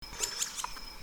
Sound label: Yellow eared woodpecker